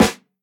steel snare drum a key, Royality free steel snare drum sample tuned to the A note